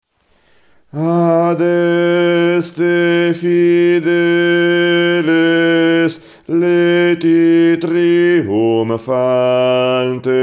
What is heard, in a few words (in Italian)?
bassi; Adeste Fideles